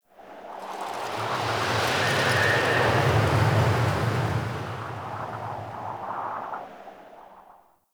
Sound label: Medium Wind Gust; Wind Gusts